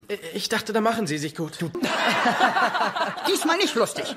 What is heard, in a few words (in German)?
Lachen; Christopher; Owen; Will Und Grace